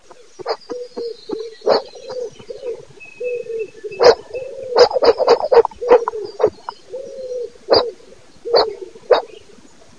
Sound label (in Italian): PORCOSPINO